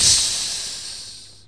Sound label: Sizzle; worms2